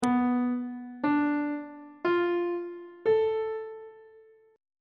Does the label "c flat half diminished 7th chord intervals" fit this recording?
Yes